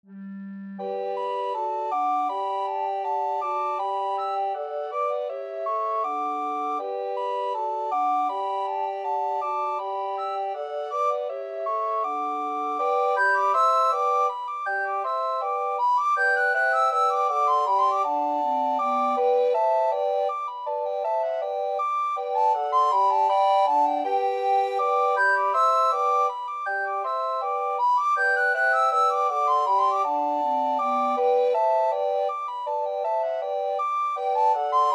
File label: recorder quartet